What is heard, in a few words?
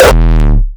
kick oneshot monster E; GhostHack